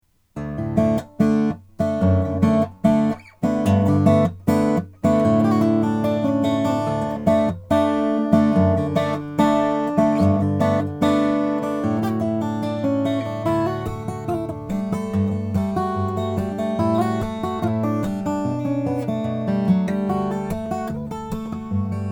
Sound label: lowden o35c madagascar rosewood